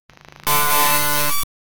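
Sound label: dark trap long glitch transition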